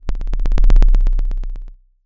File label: pop